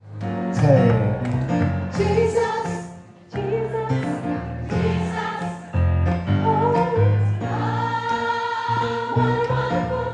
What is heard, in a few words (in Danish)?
sopran, kor; jesus what a wonderful child